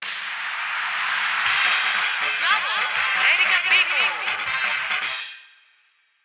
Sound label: Radio Jingle